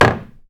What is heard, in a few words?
Piano Keys Cover Closed